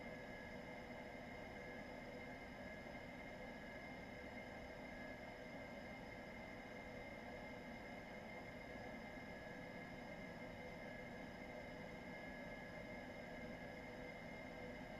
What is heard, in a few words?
H145 Avionics left; ec145